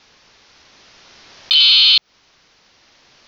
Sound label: AzaadiSAT 1k2 FSK Sample